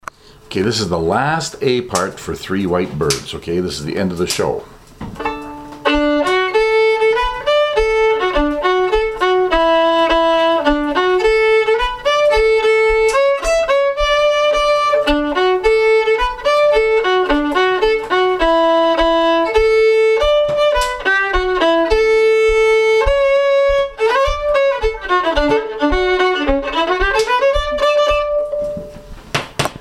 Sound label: birds, violin